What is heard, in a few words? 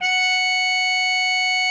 HARM F#5, TR MUTE; Xsample VOL-3